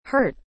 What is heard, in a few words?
hurt